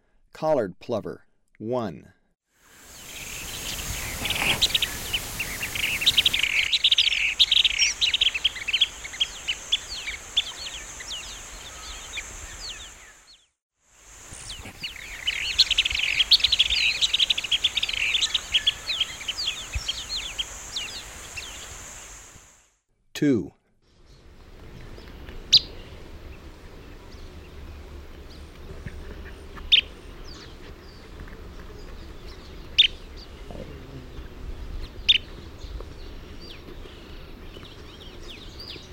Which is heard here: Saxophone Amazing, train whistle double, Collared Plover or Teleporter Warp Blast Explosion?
Collared Plover